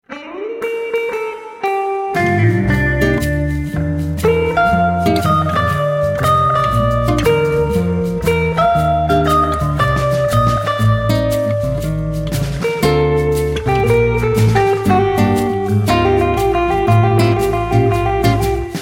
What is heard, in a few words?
swinging jazz